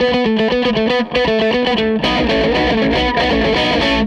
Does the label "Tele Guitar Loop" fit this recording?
Yes